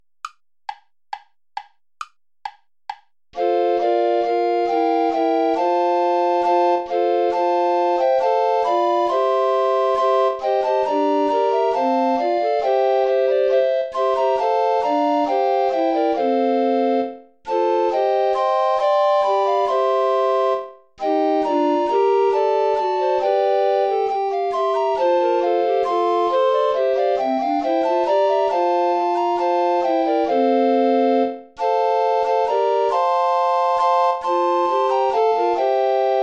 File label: two dances play along without bass recorder; morley thomas